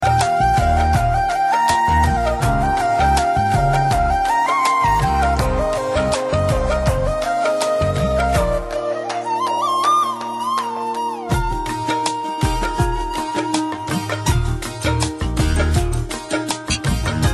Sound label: tamil flute